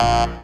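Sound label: synthesizer Dance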